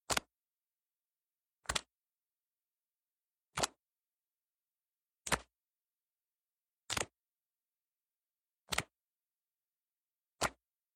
River Rafting Claps; 12 Years A Slave

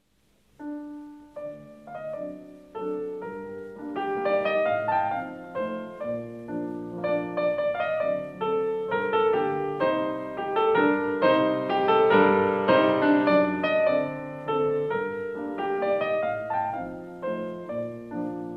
Mazurka in Sol Min.; Frédéric Chopin

